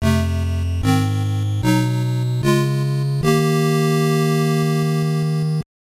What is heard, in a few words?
Jingle- Murmur ~ Chant ~ Pray ~ Invoke; Wizardry II Legacy of Llylgamyn 1989 nes